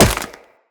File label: Wall Jump Ice; Tenno; Footsteps; Wall Run